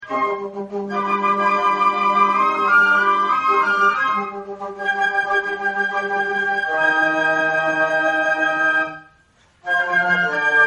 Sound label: concert, flute; AUTUMN concert 2016